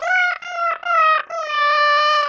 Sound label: saddest trombone high